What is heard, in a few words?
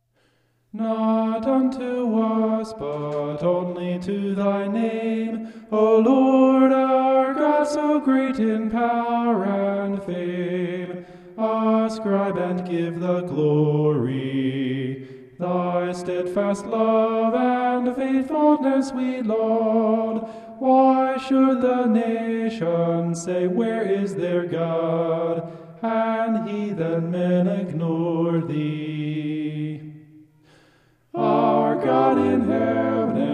vocal homoph; psalm 115